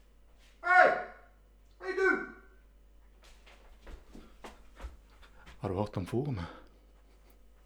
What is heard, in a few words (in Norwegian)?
skriking